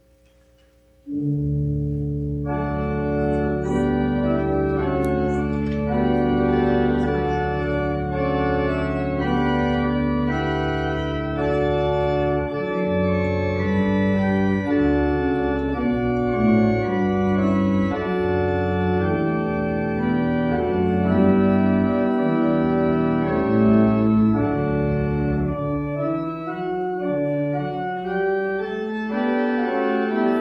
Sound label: preservice, instrumentalists